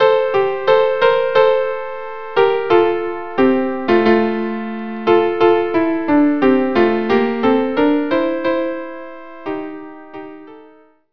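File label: unaccompanied violin; Gather Ye Rosebuds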